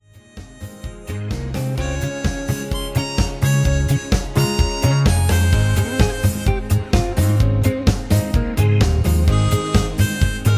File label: Instrumental